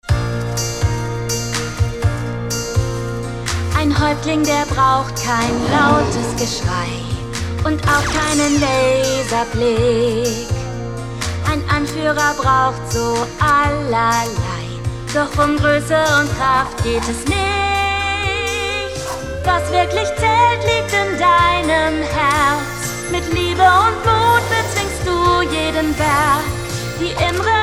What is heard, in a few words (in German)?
Gesang; Spirit Rangers